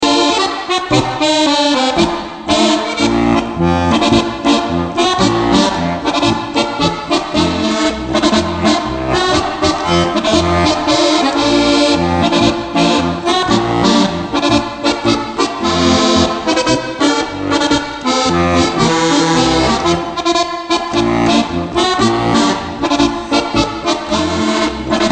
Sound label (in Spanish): acordeon polka